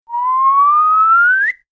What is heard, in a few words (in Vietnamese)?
tieng huyt sao cham va keo dai mot tieng huyt